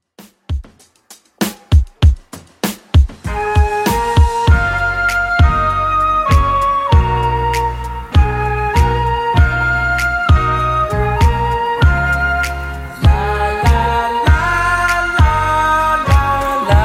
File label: 60s Redrum 98bpm